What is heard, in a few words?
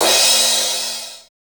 Crash, Roland MV8800; VANITY KIT